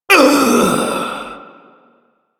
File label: Pain Long; New War; Ballas